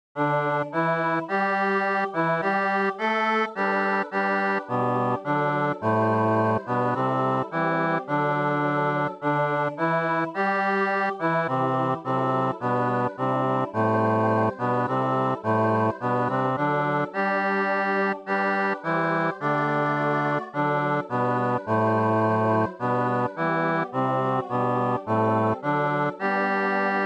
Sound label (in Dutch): bas; Alle eer en alle glorie